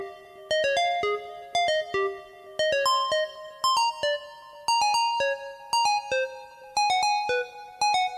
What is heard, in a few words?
new cute sms